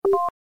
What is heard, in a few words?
alert